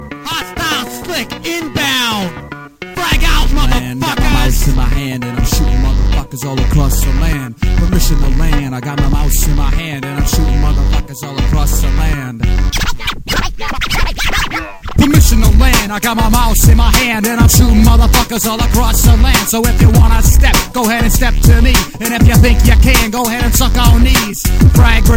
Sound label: Rap; Battlefield 2